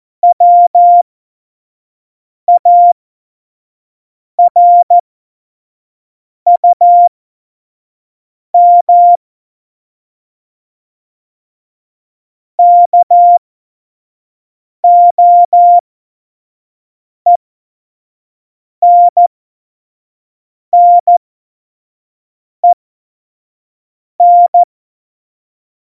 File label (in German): Morsekurs, Tempo 25; Witze